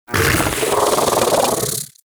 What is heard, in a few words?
Bug Vocal Mount; Hunting Critters; Inf K Drive Bug